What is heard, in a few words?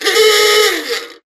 vehicle horn